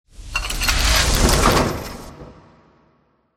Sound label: case Close; vrp jobs; ui